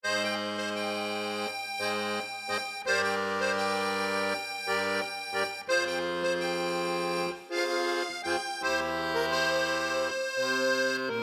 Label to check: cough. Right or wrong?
Wrong